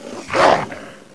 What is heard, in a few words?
wolf attack; Caesar III